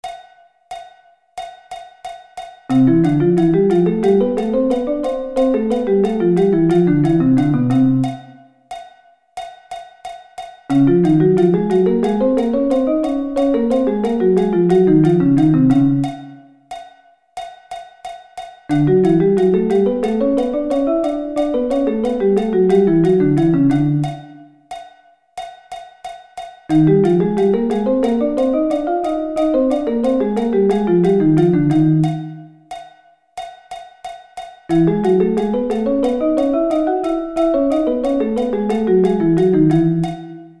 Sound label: Thirds